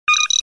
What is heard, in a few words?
computer beep